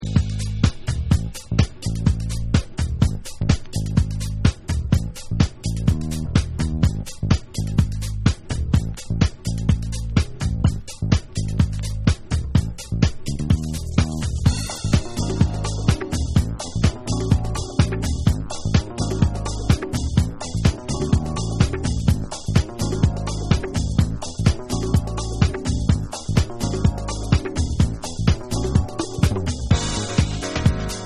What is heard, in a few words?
Yoruba Instrumental